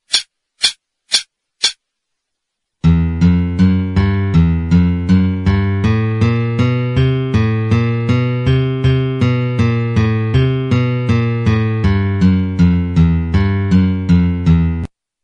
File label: alternate picking, guitar